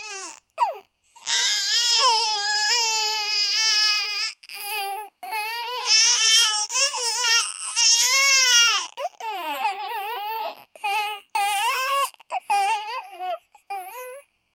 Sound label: baby cry in water; distress